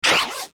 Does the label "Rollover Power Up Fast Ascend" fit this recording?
Yes